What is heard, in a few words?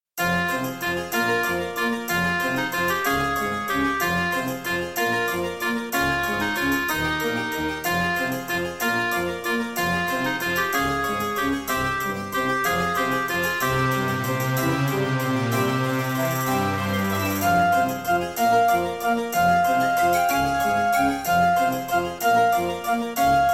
Track 6, Roland Edirol SD-20 GM Contemporary; Death Gate